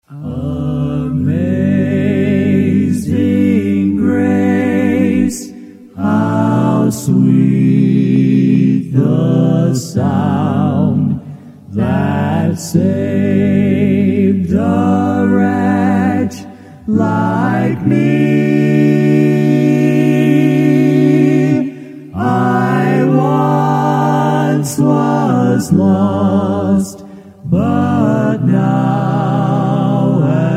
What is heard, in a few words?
Amazing Grace Quartet